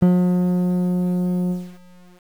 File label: pluck